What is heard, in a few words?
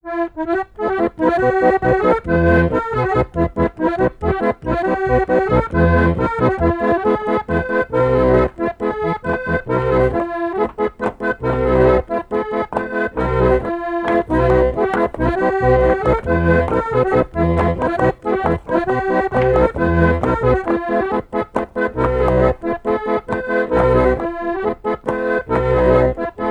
alto